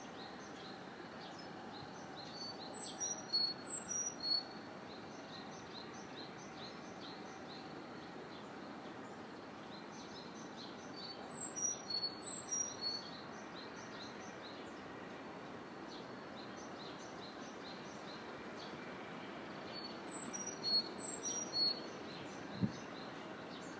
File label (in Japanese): ezomushikui